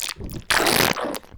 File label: fart gurg